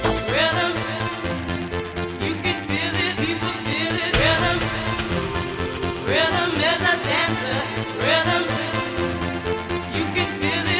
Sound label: Rhythm